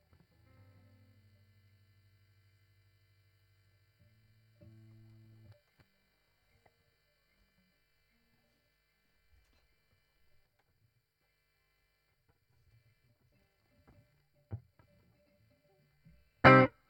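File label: guitar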